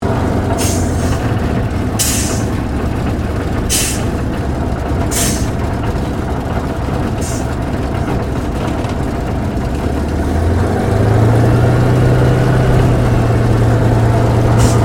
AIRCRAFT SUPERMARINE SPITFIRE MK-XVI TAXI SHUTDOWN INTERIOR; Airplanes; Airplane Sound Effects